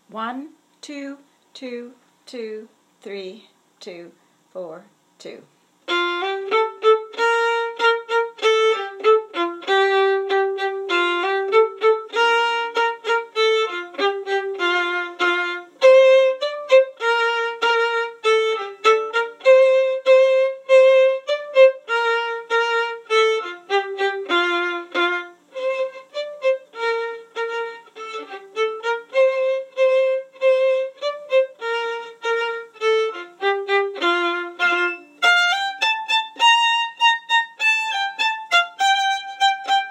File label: Mattachins violin 1 top, violin